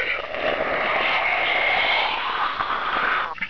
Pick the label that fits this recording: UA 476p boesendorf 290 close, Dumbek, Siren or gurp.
gurp